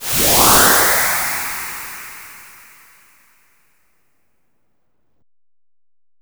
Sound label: SE MAP MOVE FLY; maimai